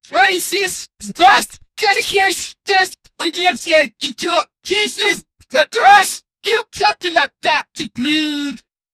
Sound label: screaming rap about apple